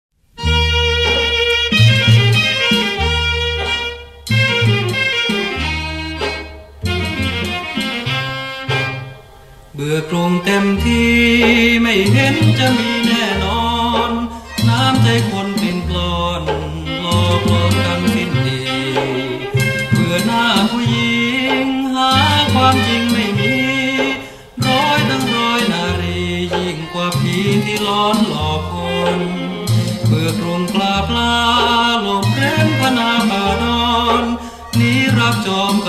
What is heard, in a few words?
Off Beat